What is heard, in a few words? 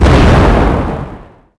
Small Exp, Explosions